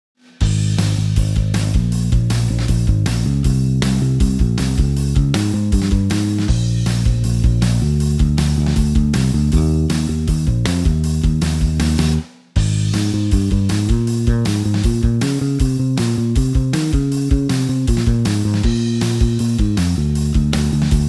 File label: rhythm modobass